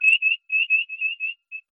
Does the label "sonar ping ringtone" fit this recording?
Yes